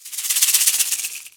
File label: toggle